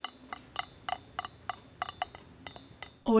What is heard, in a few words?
water drip in empty pan small double drips; prosoundfx